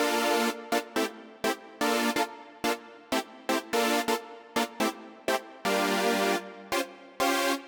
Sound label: Synth, 125bpm Dm, Nu Disco; Zero-G; Construction Kits; Speedy